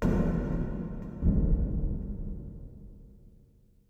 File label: metal hit far; soundscapes; occultists